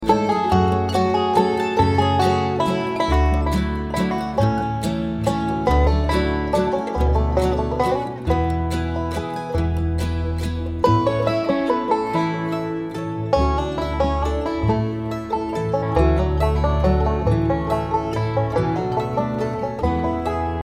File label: waltz; midsummer